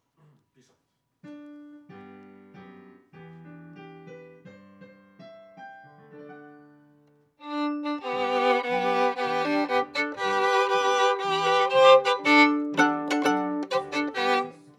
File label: Viola D